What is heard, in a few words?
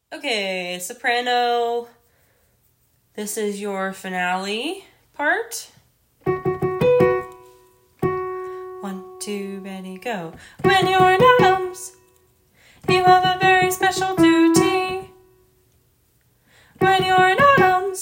sopranos